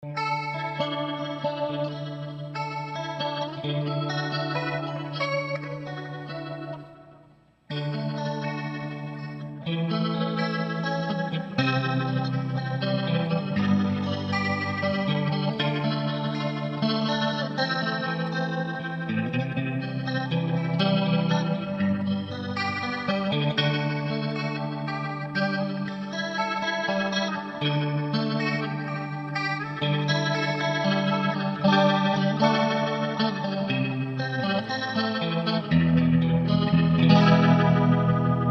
Boss RT-20 fast, Here is the RT-20 on mode II in fast speed; Here is the RT-20 on mode II in fast speed, going through different pickup positions on my st; Boss RT-20 Sound Clip 5